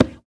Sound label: CNGO, African Perc; Spectrasonics; Supreme Beats; African Contemporary; SB Afro DRMs